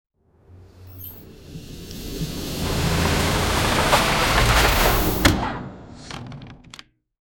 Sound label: inter dimensional portal